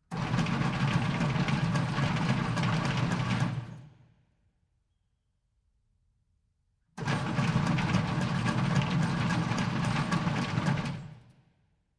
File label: Industrial Mechanical Large Conveyor Stone Soil Start Stop Short; Conveyor Belt Sound Effects